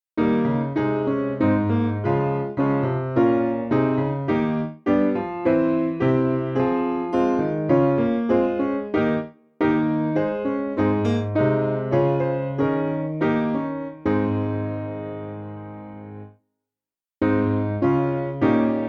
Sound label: piano, hymn